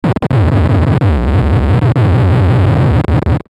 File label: Stutter Low Buzz; Stutter Low Buzz Pulse; Production Element Imaging Element Accent Transition